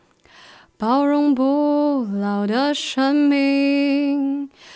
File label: Alto